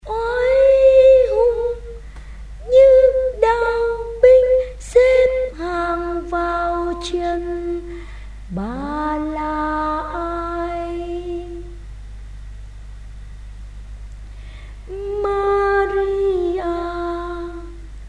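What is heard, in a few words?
soprano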